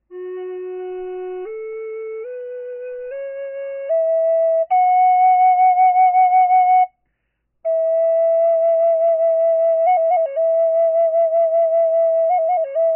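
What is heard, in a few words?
natural, wood flute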